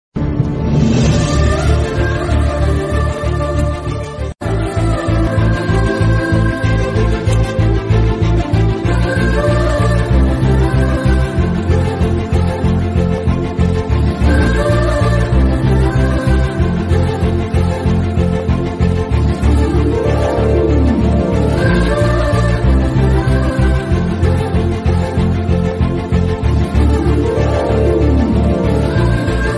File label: instrumental emotional bgm best romantic background music tone mobile ringtone